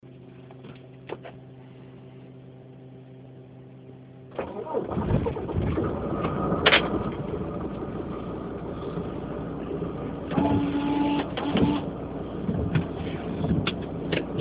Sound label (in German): 312D Anlassen Anfahren